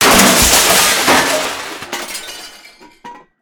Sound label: break, armor; combat; Cosmic Rage; The Experience-light